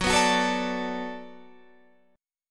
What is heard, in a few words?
D 6th Fsharp, chord